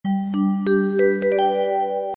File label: notification ringtone